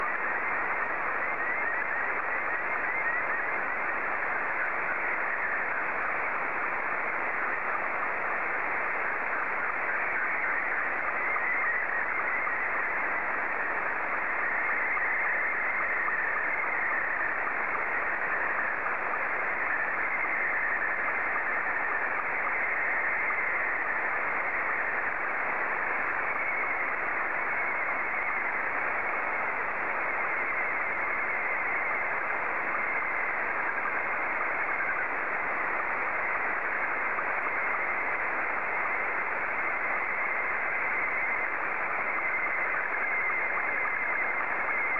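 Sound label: Scottie 2, slow-scan television; JL1FQZ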